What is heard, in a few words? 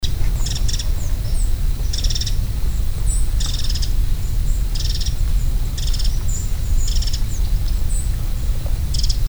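Winter Wren Chatter, Worcester, Maryland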